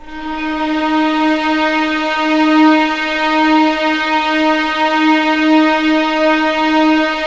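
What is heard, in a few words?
D#4 legato mf violins, left; East West; The Ultimate String Collection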